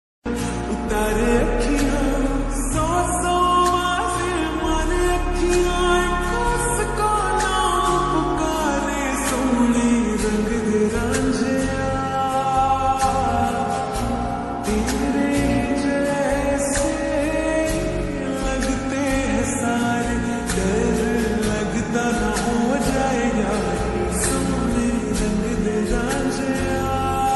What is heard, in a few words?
love ringtone song ringtone